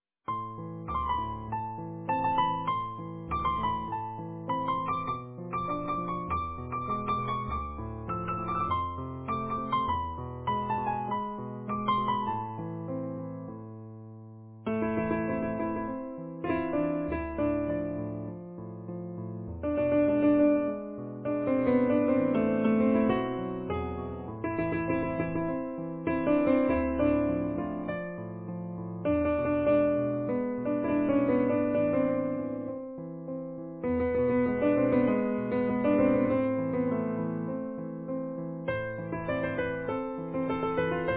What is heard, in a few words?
Easy Piano